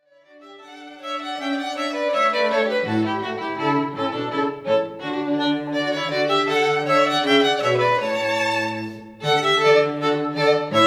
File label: Rondeau, chamber music trio